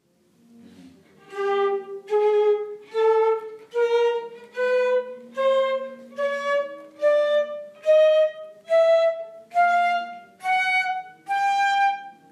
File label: violin flute